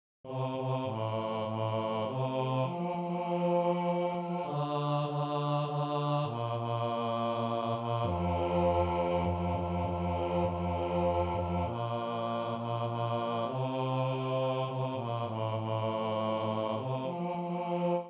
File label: bass